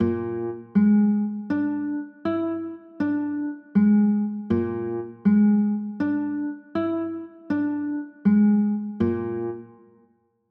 b flat 9 sharp 11, chords

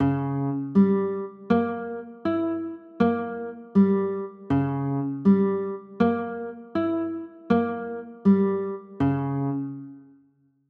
b flat dim, chords; b flat dim7

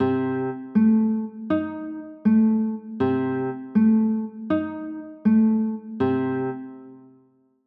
c dim7, chords

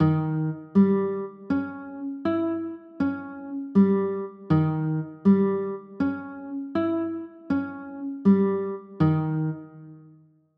d sharp 7 b9, chords

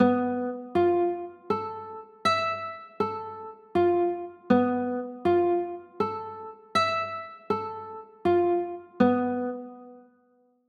g 13, chords

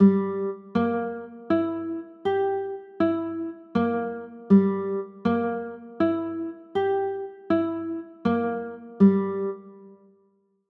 g m6, chords

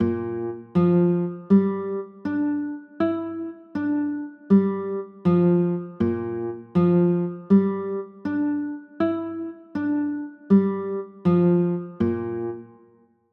b flat 9 sharp 11, chords; b flat 6 sharp 11

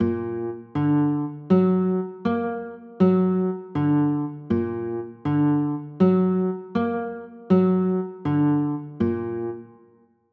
d aug, chords

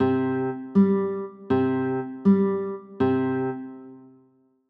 c 6, chords

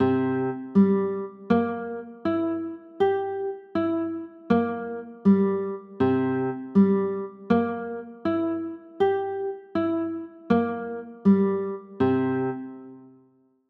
c 6, chords

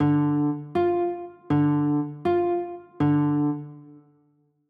d m, chords

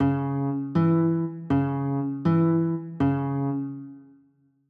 c sharp m, chords

